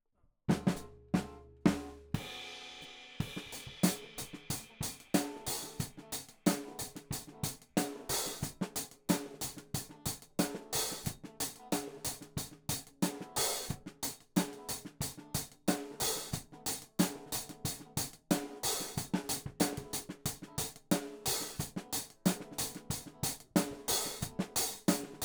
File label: Hat